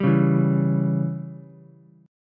Db Diminished Db down Ukulele; strummed; Dbdim chord; JGuitar

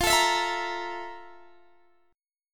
F Major 11th, Guitar, Open D; x,20,19,22,19,20; down; chord shape

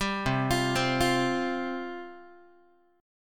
C Suspended 4th C down Guitar; Csus4 arpeggiated; JGuitar

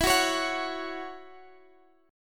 Db Minor Double Flat 5th E

